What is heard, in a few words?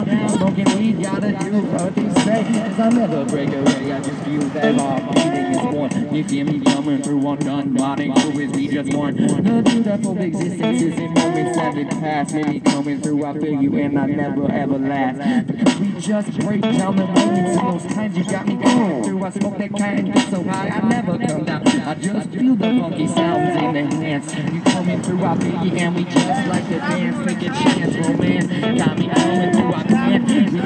funky rap; san francisco haight ashbury